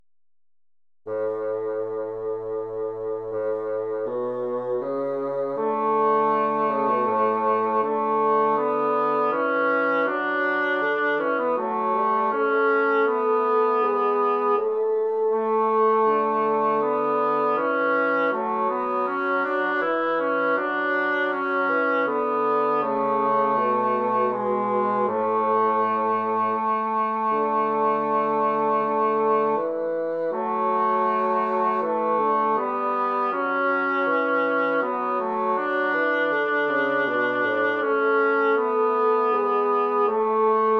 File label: Gloria, claves, Tutti; Certon; Missa Christus Resurgens; Polyphonie